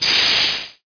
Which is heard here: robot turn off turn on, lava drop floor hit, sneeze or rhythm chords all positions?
lava drop floor hit